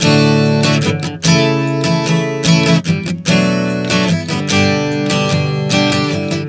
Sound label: Progression 074 D-A-Bm-G, Acoustic Guitar, Chord Progressions 074 bpm; Sonic Foundry; Songwriter Acoustic Guitar Companion